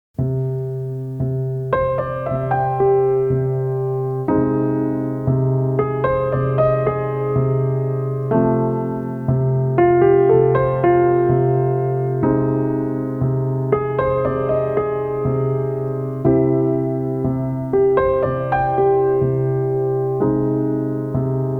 piano

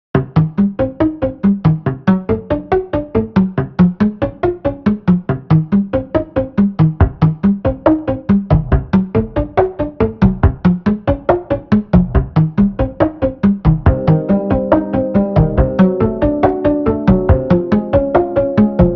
folk music

